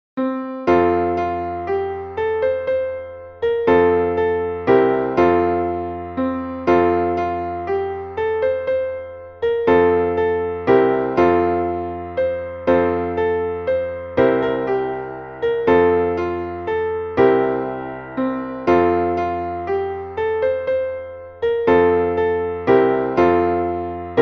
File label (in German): klavier; es wird schon gleich dunkel